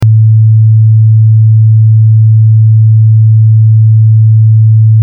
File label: cos 110